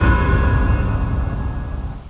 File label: eerie bell